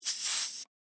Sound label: Industry, Air, Hose, Leak, Short; Air Pump Sound Effects; Air Pumps (Sound Effects:Machines)